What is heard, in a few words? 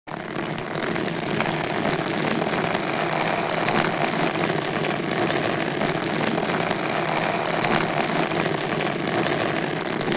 Rain Steady Heavy Rain